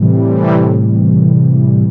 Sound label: house synth 125 A, keys